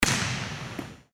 volleyball overhead serve

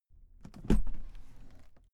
suitcase open case soft

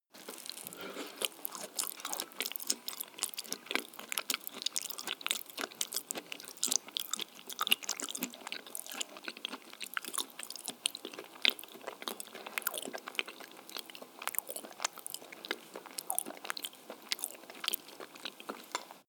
male eat smack

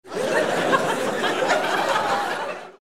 laugh

